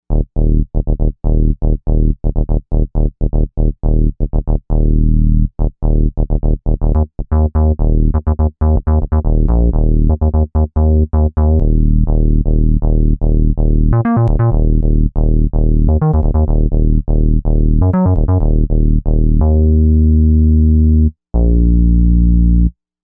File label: Bass, Novation UltraNova; Factory Patches; J1Zero6Bass